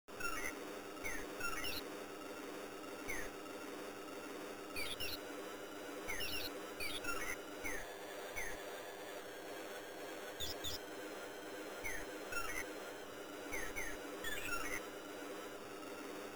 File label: Atmosphere; Valhalla Before the War; amiga; Level 4